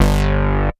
Synth Bass; Vengeance Samples; VEE Synth Shots

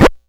Needle Drag, Vinyl Record Samples; Needle Noises

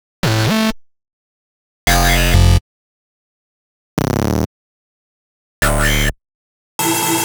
128BPM Cuts; Query; Vengeance Samples; Query Kit

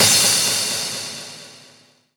Crash, Cymbals; Vengeance Samples; VEH2

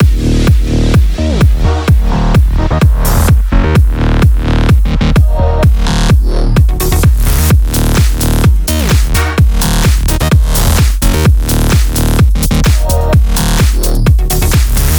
128BPM Mix; Vengeance Samples; Dynamite Kit

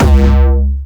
Jumpstyle Kick C#2, vengeance essential bassdrums; vengeance essential club sounds-3; VEC3 Bassdrums

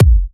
Hard Kicks; Vengeance Samples

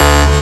Synths D; Vengeance Samples

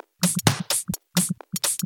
Electro Loop 128 BPM; Vengeance Samples

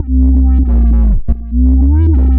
Roland B Line, Roland S100

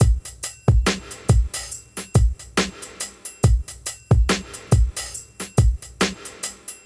Slomo Beat 140 BPM, Loops, Slow Motion Beats

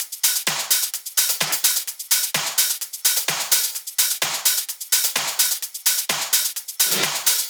128BPM Classic Kit; VFH1 128BPM The Classic Kit

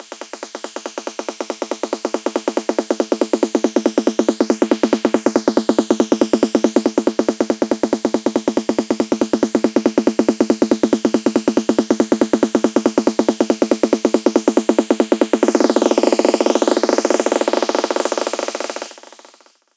140BPM Drone SNARE 12Bars; Vengeance Samples; Snares FX Pairs

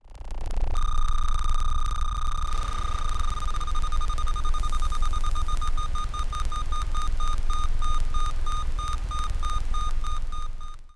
Roland E Noise, Roland S100

